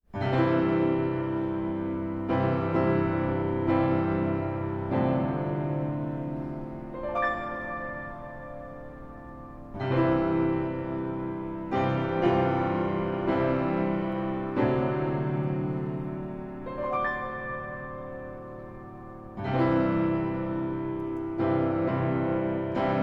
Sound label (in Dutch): pianobegeleiding